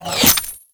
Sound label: Pistol Projectile Pass By; Temple Signature; Warframe; Tenno